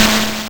Snare, YM, CHIPSHOP HITS DRUMS; ChipShop Deluxe

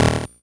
GB Bass D#; CHIPSHOP HITS

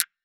Tech Drum Hit, techno 120bpm

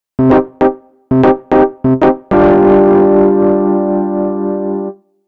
Keys Line 97 C, 97bpm, hiphop; musicradar

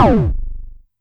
Amdek Perc

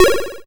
YM Arp A#m; chip hits; arps; CHIPSHOP DELUXE

